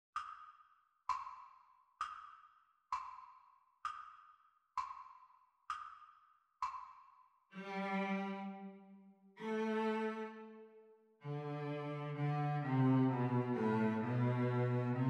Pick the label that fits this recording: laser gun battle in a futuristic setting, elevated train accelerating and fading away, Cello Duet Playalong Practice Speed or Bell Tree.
Cello Duet Playalong Practice Speed